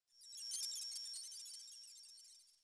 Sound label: Computer Room Ambient Beeping; Warframe; Ambience; Nineteen Ninety Nine